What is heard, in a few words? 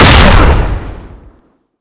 laser, sound/weapons